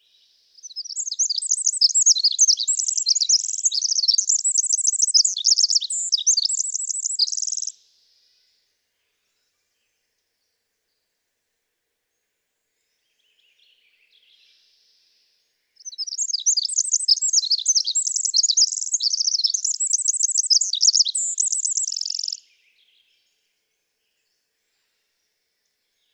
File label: Pacific Wren